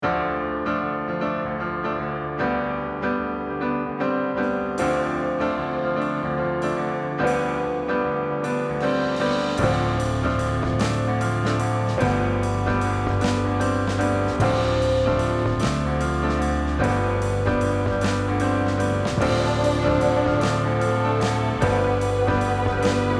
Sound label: World Loop; Bloxiq; Android; PS Vita